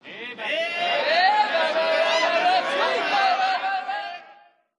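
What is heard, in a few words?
Ambience Baseball Crowd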